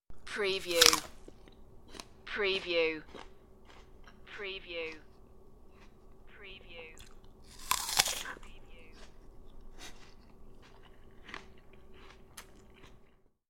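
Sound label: Eating Apple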